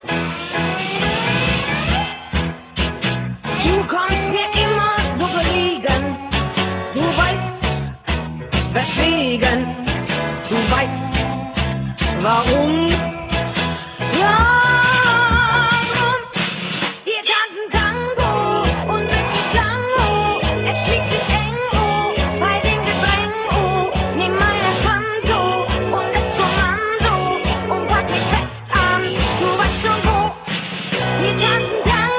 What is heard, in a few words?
tango